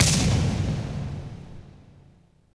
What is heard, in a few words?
SFX FIREW; AMG - Now CD-ROM (Roland); Megabass 1